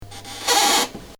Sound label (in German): PC Schrank